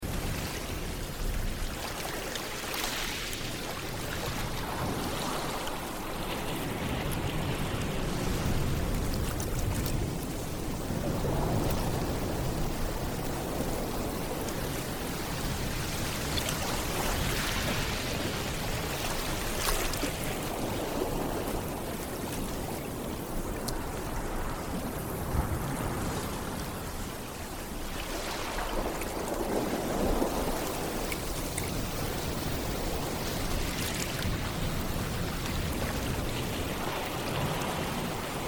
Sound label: Calm Ocean Waves Background